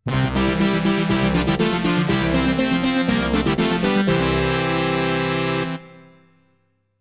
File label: Success (Stage Clear); Dark Seal